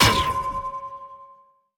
goal miss bounce, Ball Collision Goal Frame

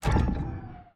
Player Conveyer Release

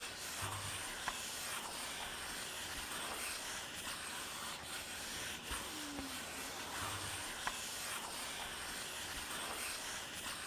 Joystick Center Motion; Deathrun

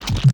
Gentle Switch; Deathrun

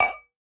Ball Collision Holiday Mug Soft

